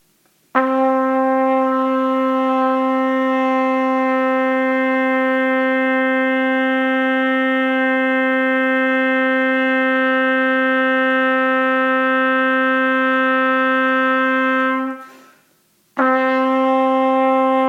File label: Long Tones Low D, Trumpet